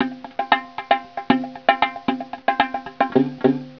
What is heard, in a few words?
drum